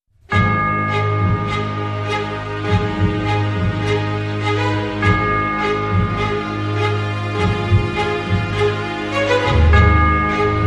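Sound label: Hybrid Sting, Orchestra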